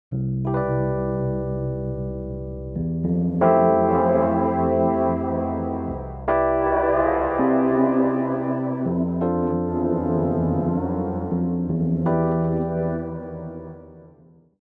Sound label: Blur keys Dimension